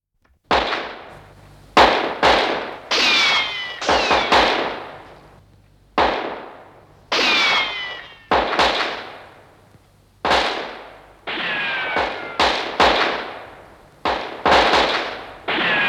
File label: Western Movie Style Gunbattle